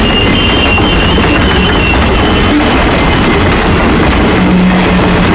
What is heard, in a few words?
Train; func train